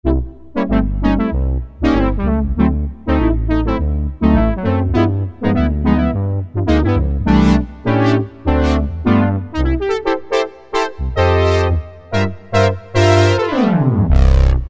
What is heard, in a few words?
Brass Horns, Roland MKS-50; Factory examples